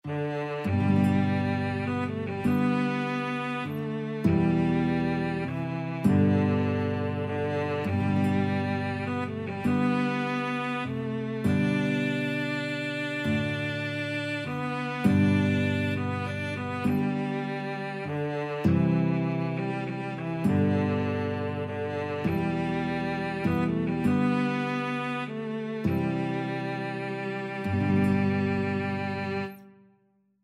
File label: cello guitar duet; amazing grace